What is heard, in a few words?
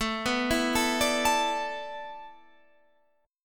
A Suspended 2nd Suspended 4th